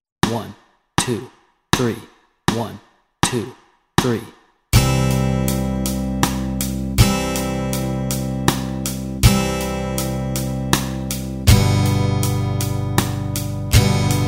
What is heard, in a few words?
Rock a Bye Baby Chords Track, guitar